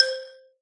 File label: brick combo; candy crush saga online